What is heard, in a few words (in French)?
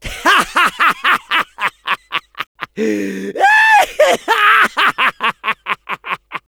Medic laugh long